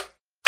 slime block; minecraft

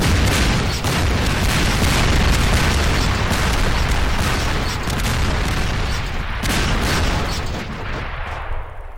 explosions; gmod

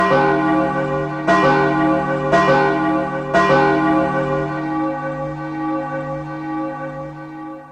old church bells death

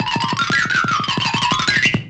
running footsteps and xylophone scales

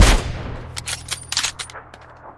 kar98k; blyat mellstroy

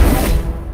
1 kill; valorant

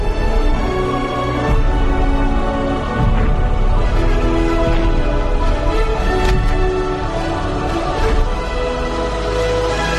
ending theme t rex roar; jurassic world

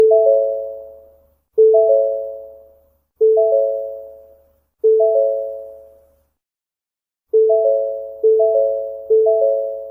ford chime

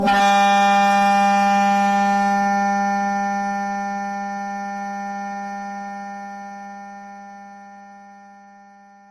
lobotomy sound effect

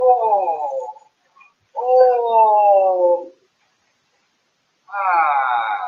ooaah

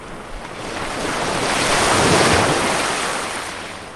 ocean waves

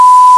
censor bleep

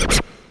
combobreak; osu

